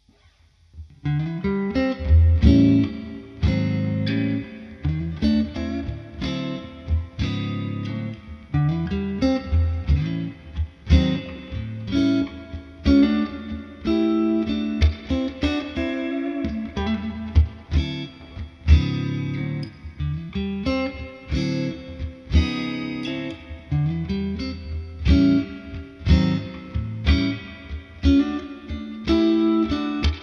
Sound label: clean, strat vintage